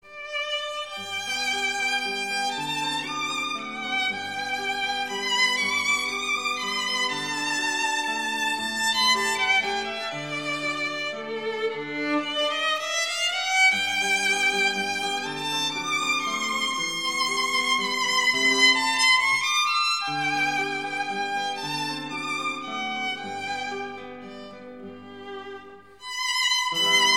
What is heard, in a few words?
Duetto in G Major Larghetto; Paganini; Larghetto de capo